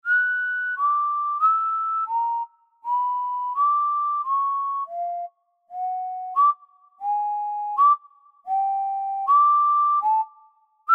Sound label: No Cello; Ending Sequence